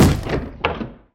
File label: ghost doors